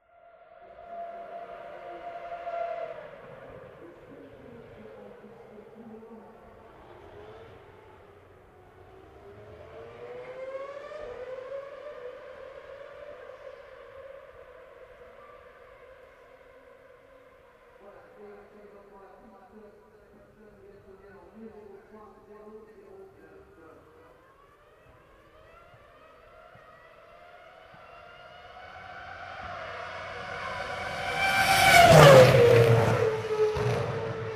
Autos, Formula 1 racers passing at Eau Ridge at Spa; 3D-Binaural Audio